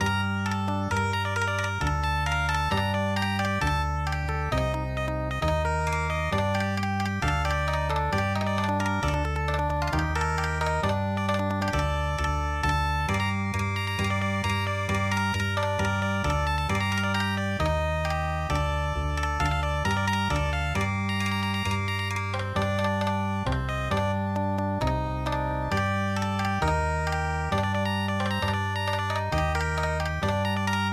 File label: yakko san okinawa